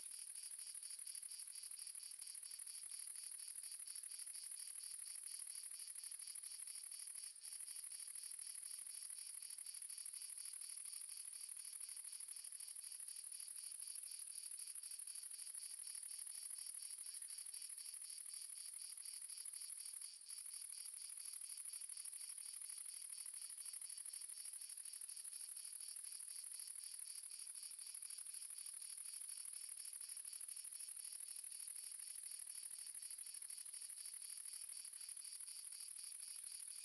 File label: amb crickets multiple south france; sonniss gdc